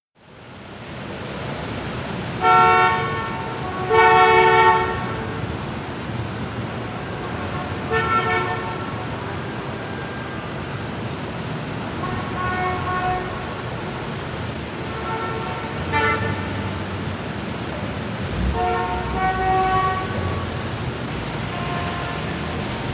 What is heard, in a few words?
Times Square Traffic, big city